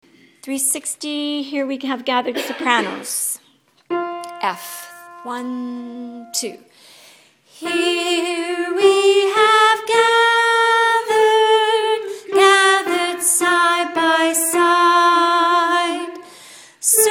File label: Soprano